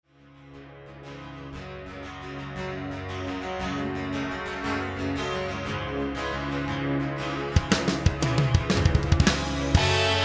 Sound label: Solo Test